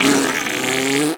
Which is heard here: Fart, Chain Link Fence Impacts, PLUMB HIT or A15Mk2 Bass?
Fart